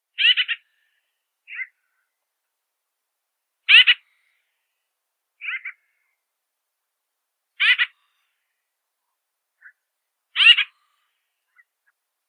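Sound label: grosbeak, Mycerobas carnipes; dubonos archovii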